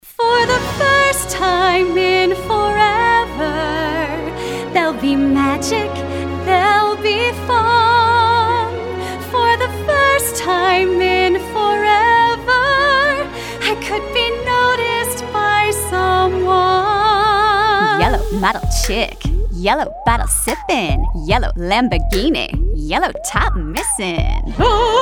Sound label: Singing, en-US